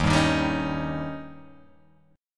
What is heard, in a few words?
Eb 7th; Eb7 strummed; JGuitar